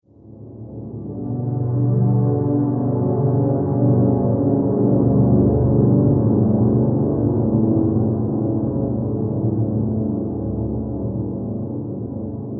Galaxy Transition, Transition Sound Effects

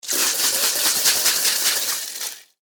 Household Pesticide Can Shake; Yard Tool Sound Effects; Yard Tools (Sound Effects:Tools)

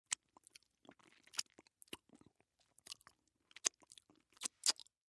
Human Lick Fingers; Eating

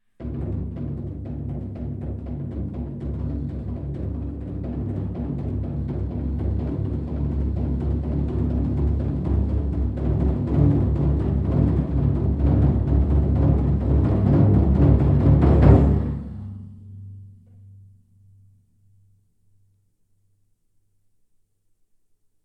Drum roll without snare long; Drum Kit; Tom Hutchings

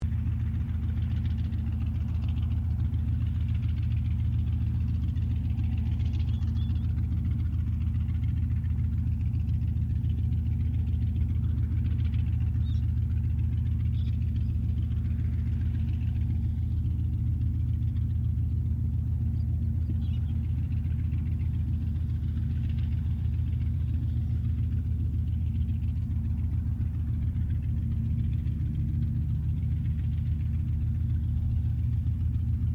AIRCRAFT CA-28 CERES IDLE DISTANT; Airplane Sound Effects; Airplanes (Sound Effects:Vehicles)

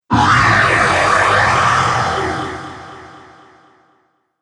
Timewarp Dragon, monster; horror sound effects

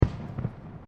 Fireworks Single Explosion; Fireworks (Sound Effects:Backgrounds); Crafted Signals

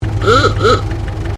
Truck Chevrolet 1926 30cwt Horn; Classic comercial vehicles; Trucks

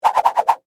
Whip Whoosh Multi, Throw; Foley; Whips (Sound Effects:Objects); BlastWave

